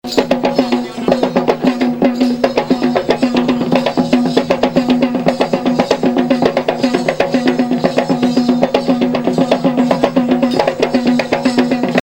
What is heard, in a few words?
india drums